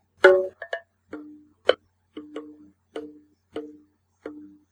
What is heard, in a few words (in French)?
percussions